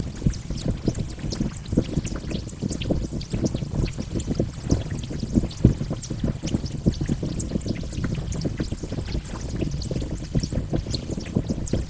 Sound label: fire